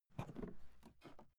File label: briefcase open; industrie wirtschaft; bueroarbeit